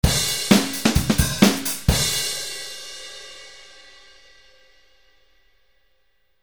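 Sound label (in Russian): соло на барабанах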